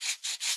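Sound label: Special Photo Crayon Environment Draw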